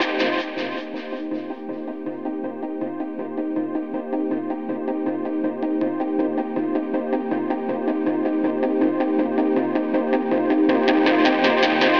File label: AMBNT 160; eJay; Ambient